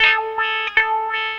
EVIL GTR, Funky GtrLicks; E-MU Formula 4000 Series Vol. 1; Hip Hop Nation

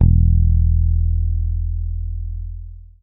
SADWSKY MUTE, Bass; Sampleheads; Will Lee Bass Library; SADWSKY5MUTE

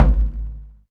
DM5 Perc, Alesis DM5 drum machine

